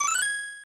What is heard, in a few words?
Magic Wand; Panic in Nakayoshi World; SNES